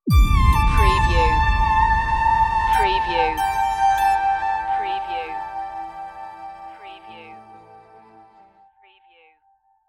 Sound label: dance nation stinger